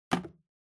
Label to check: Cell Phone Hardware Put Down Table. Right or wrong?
Right